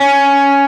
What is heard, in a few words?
arp; osu